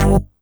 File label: Synth Hit G